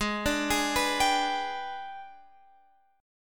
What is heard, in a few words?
Ab Minor Major 7th Flat 5th, down, Guitar, Open F; AbmM7b5; arpeggiated